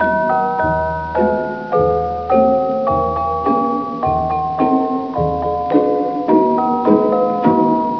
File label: ballet; Aimtech Test Drive - Icon Author & CBT Express